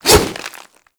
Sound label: Infested Charger Swipe